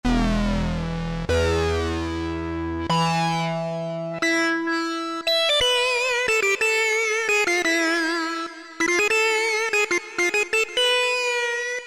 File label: Sync, Cheetah MS6